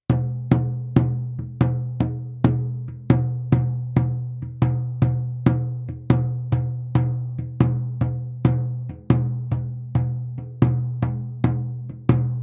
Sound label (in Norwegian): SOUND, sjamantromme med geiteskinn og treformet handtak; sjamantromme med geiteskinn og treformet handtak 50 cm